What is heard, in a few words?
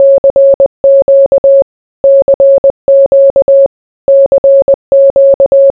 morse code cq cq cq